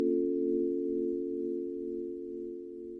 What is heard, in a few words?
secret chime; lara croft tomb raider anniversary ost